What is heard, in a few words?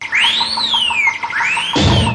space whistle; Electro and Synthetic